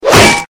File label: rising ram impact; billy ed edd n eddy sfx